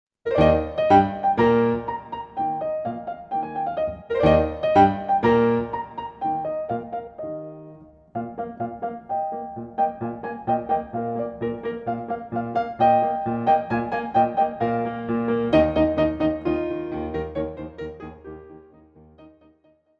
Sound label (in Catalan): Piano Sol; Robert Gerhard